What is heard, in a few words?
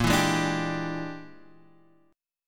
E 7th Sharp 9th Flat 5th A sharp, guitar